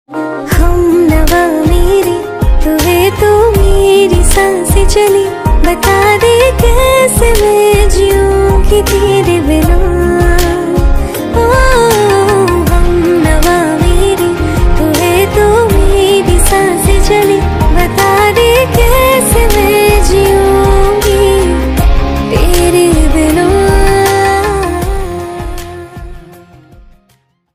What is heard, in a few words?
FEMALE VERSION RINGTONE